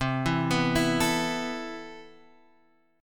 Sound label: A Suspended 2nd C; arpeggiated